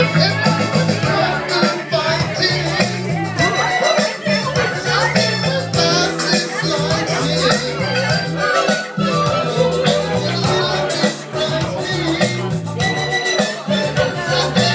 karaoke

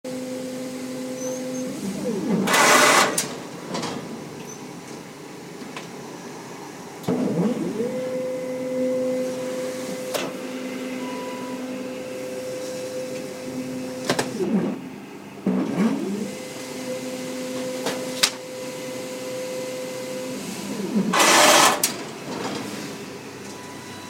sound printer

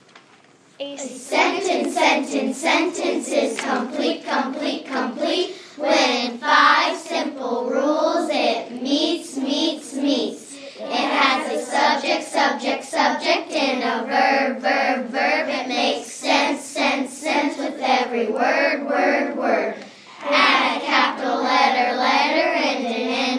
sentence jingle

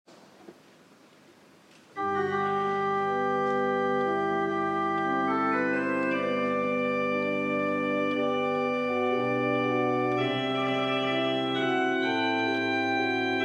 gospel improvisation; for good shepherd sunday

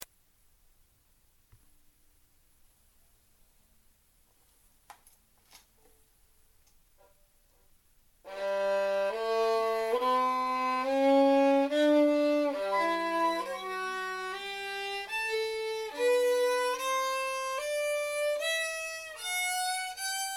g major scale playing test

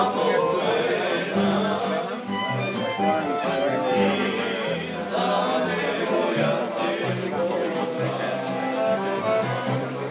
macedonian traditional music

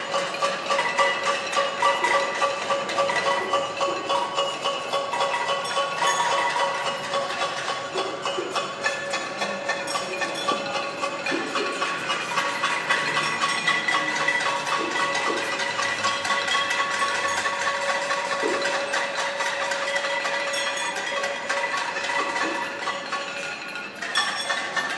banging pots political protest Barcelona

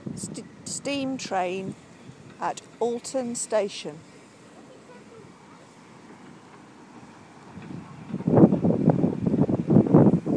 steam engine changes ends at alton station

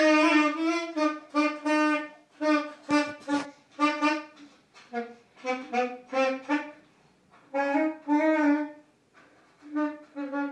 sax mute

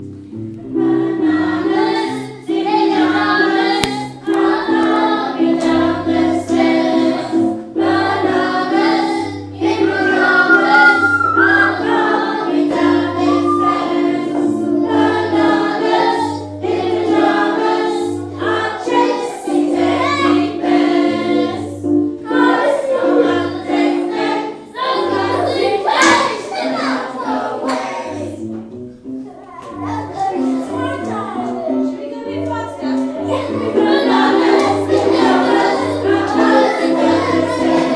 silver class singing

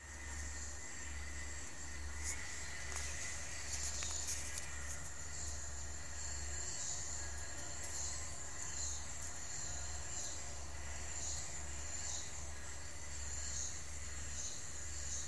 toilet cubical ambience complete with leaking tap

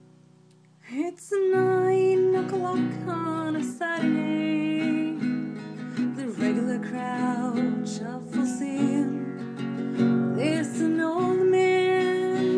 piano

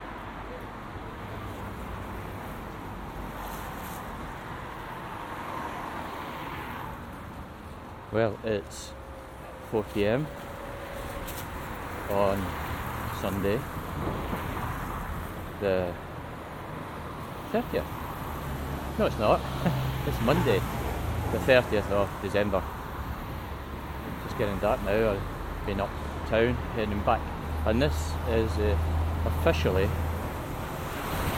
binaural busy streets soundscape; roland cs-10em binaural mics first test; binaural recording

